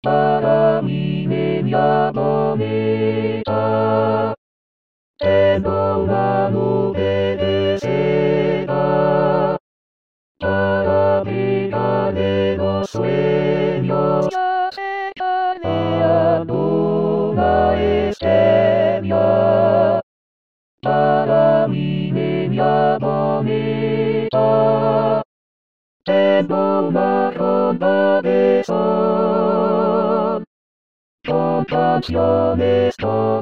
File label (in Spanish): TODOS VOZ